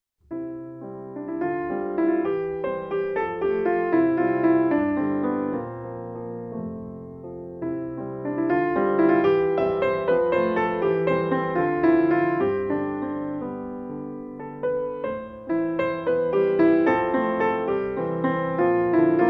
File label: micro waltz